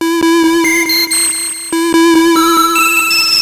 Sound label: lead